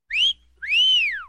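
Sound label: sounds of cartoon whistle at the sight of a beauty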